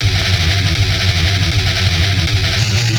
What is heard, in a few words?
speed metal